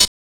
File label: CYBER KIT, DRUMS